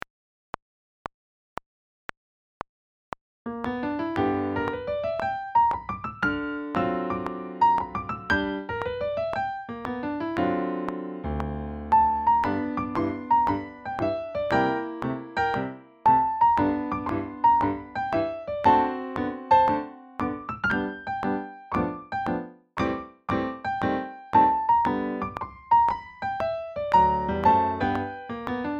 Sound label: New Orleans Swing